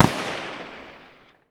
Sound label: vpo209 fire raw; Escape from Tarkov